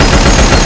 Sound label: Assault Rifle Shot Loop; SFX Kit; Weapons